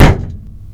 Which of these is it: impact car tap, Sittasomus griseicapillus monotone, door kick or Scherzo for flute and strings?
impact car tap